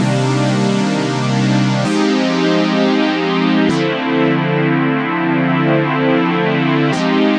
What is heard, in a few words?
130 bpm, pad, drum loops and breaks